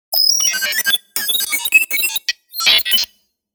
Glitch accent distorted computer

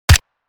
HK416 assault rifle suppressed shot

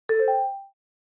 Notification alert

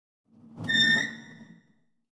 Metal squeaking from movement

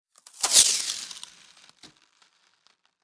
Cinematic transition ice whoosh

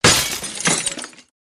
Broken glass impact hard hit

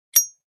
Grenade safety pin pull; Military Equipment

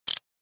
Prisoner handcuffs latch closed

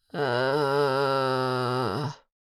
Adult female illness moan

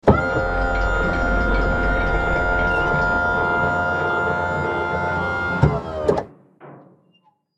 Tank turret moving turning mechanical noise; artillery

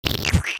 Cartoon fart splat wet airy bubble

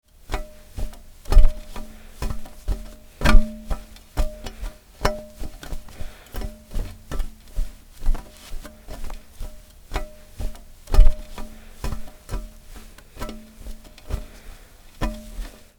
Clean a chandelier with wipe household cleaning

